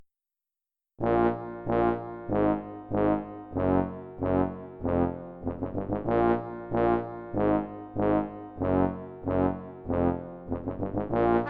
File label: Fanfare; Toy Box; Performed by computer, using Finale and software sound modules